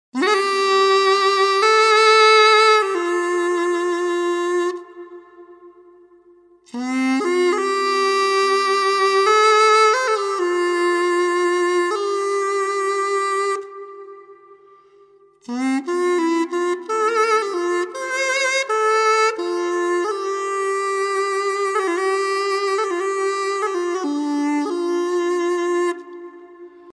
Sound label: wooden sax sopran